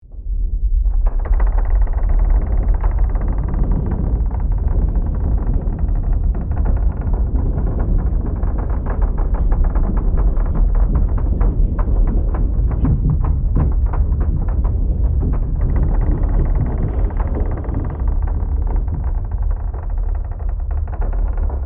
Deep earthquake wooden cabinet creaking and vibrating